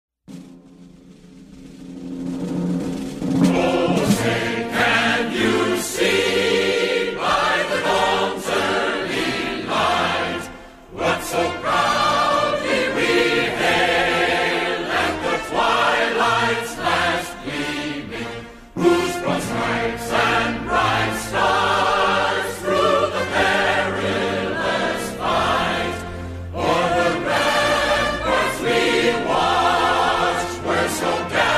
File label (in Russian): gimn usa